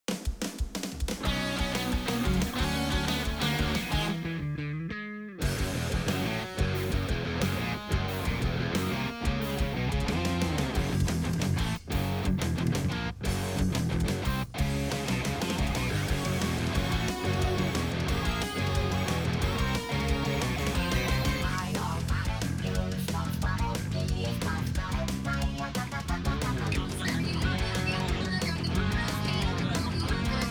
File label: BGM Versus Thunder